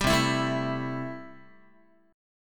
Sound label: C 6th, Guitar; strummed; 10,8,7,7,8,x; 4A,4E,5A,5D,5G#,6C#; chord; JGuitar